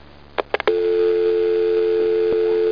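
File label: PHONE BUSY; stoker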